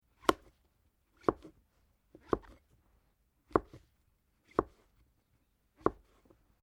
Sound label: MUSHROOM CUT SEQUENCE; Various food preparation; Restaurants